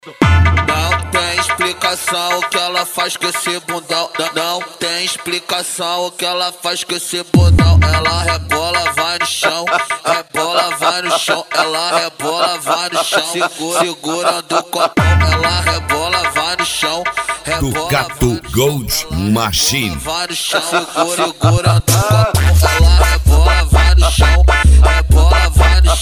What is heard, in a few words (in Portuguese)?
especial funk